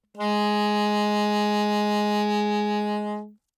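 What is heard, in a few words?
Soprano Saxophone vibrato ff Ab3; Woodwinds; Bb Soprano Saxophone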